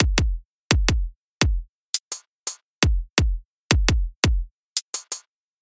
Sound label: R Drum Mix